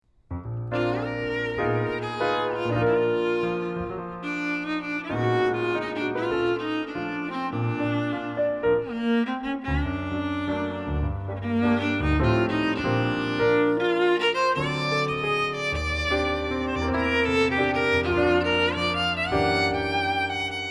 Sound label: viola